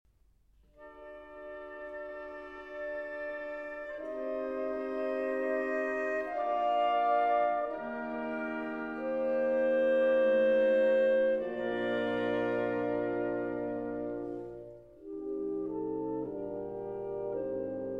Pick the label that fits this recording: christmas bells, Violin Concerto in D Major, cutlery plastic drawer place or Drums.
Violin Concerto in D Major